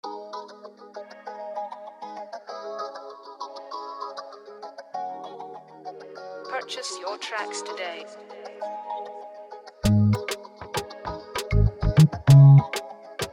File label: 98bpm, afro type beat